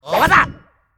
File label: Yellow Attack; Character Yellow Attack